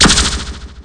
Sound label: LASER; Shadow Master